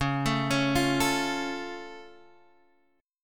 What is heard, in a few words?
C sharp Augmented, C sharp, down, Guitar, Standard; arpeggiated; capo=2